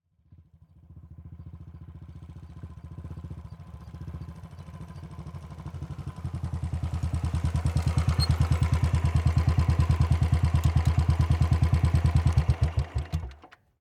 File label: Royal Enfield, Bullet, Very Slow, Approach, Squeak, Idle, Stop, Royal Enfield Classic 350; Bombay Sonic